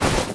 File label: move soft; scripted sequences, a1, safehouse